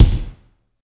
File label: thumpy kick drum sample c sharp key; kick drum samples